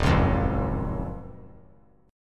D sharp Minor 9th D sharp, down; strummed

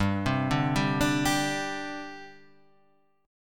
G Suspended 4th, Gsus4 chord; arpeggiated; JGuitar

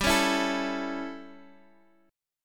A Major G; A/G Chord {X X 5 6 5 5} strummed

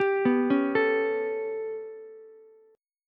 B 7th Suspended 2nd Sharp 5th down Ukulele, chord; B7sus2#5; arpeggiated; JGuitar

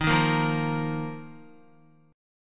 D sharp Suspended 2nd, Guitar; strummed; JGuitar

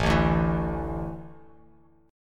A Suspended 4th, Bass Standard; Asus4 strummed; JGuitar

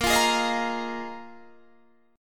Bb 7th Suspended 4th Bb Guitar Half Step Down

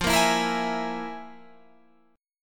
F Minor Major 7th Double Flat 5th

